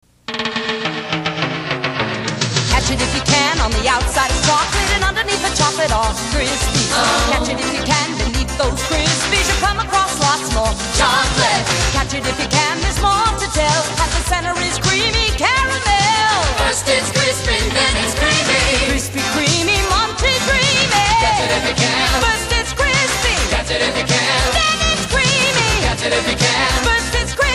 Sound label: jingle